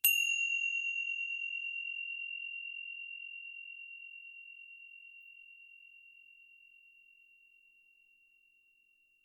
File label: tibcymb distant weak sound